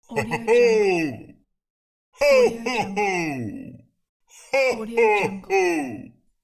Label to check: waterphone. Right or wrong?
Wrong